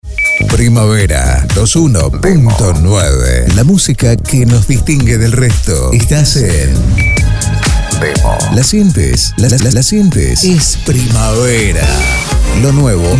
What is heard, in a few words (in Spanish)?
Urbano; Demo Primavera 2019